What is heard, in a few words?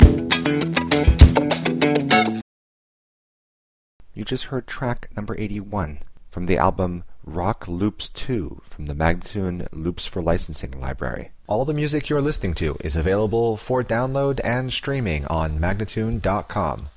100 E spoken, Rock Loops; Loops For Licensing; MagnaLoops